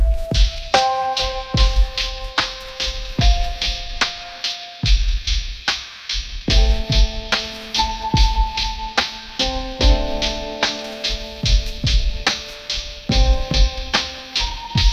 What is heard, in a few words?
cinematic lofi dreamscape, ringtones